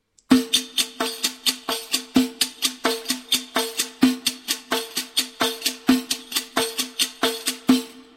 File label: riq